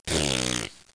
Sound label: bummer